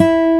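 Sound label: E 3 2 OVATION, acoustic guitar; AKAI S6000 CD-ROM - Volume 1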